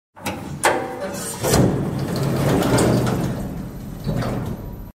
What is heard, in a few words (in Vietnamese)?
tieng thang may dong cua